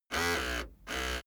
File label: servo sequence